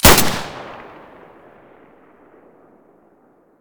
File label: m9a3 muzzle stereo; beretta